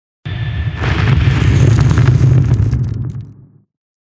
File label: Fire element spell